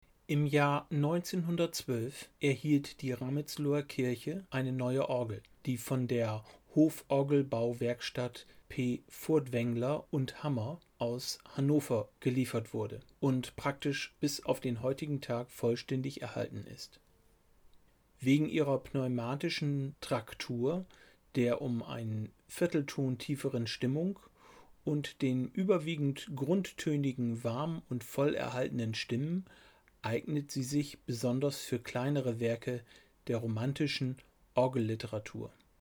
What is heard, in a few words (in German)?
Orgel klein